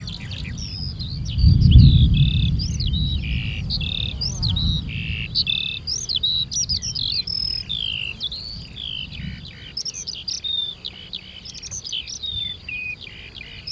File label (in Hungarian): mezei pacsirta mennydörgés gémeskutaknál; hortobagyi puszta